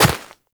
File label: Operator Run Snow, Footsteps; Warframe